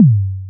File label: tom; kogamusic